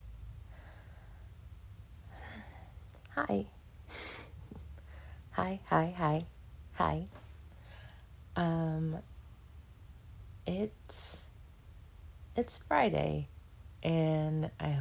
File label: F4A, F4M, Rainy Friday Ramble, ramble fap, moaning, lots of moanin; masturbation